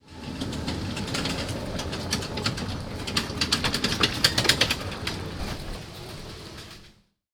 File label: tourist train passing; vehicles; NS Studios - ultra sound collection